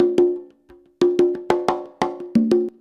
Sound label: CONGA, Modern Percussion Loops